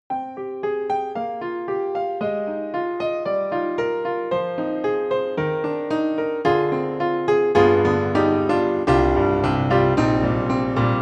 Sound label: Grand; KORG KRONOS 2; Berlin Grand SW2 UC